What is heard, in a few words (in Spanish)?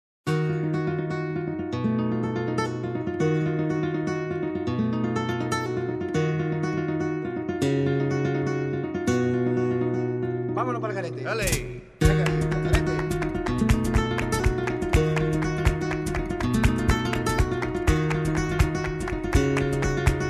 Bulerias, flamenco